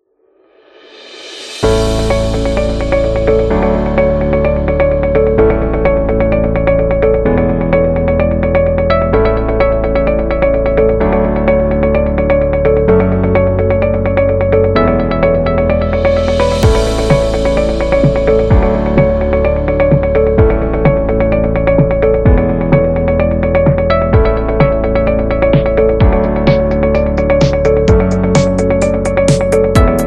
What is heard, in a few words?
The Corporate Background; friendly